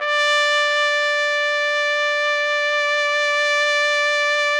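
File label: TPT, trumpet; Roland - LA Composer vol. 3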